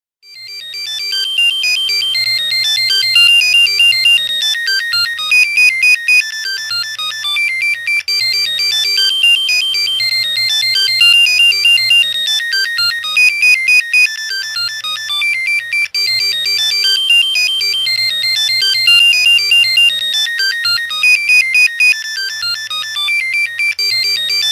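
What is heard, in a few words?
Motorola Old handy sound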